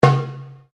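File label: Drum Darabuka Slap forte, Egyptian Darabuka Drum; Commanly available Egyptian Darabuka Drum; Drums; Sound Effects:Musical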